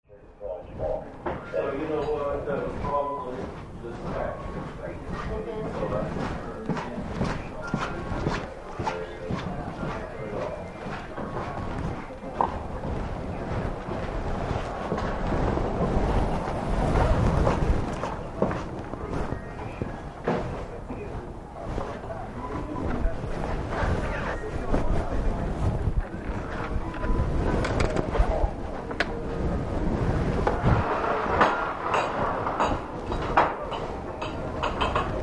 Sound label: gift shop